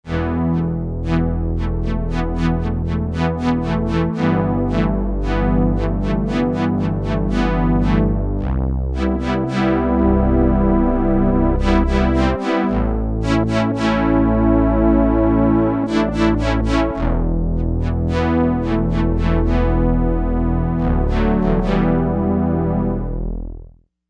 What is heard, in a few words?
Analog Strings; synthesizer; Guess the synthesizer game